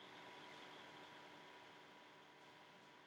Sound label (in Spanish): CASA SILENCIO